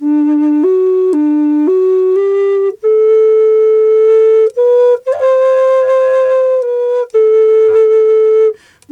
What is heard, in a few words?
D2 108, Didgeridoo